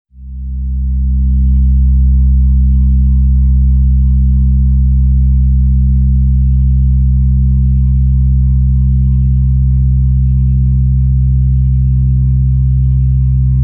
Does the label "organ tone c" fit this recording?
Yes